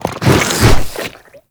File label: Infested Ancient Strike Flesh; Warframe